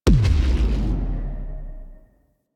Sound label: Thumper Explosion; Powers; Warframe; Railjack